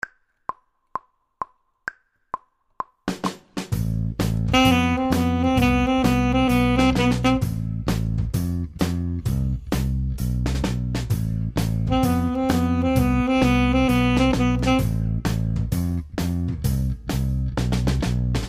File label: alto sax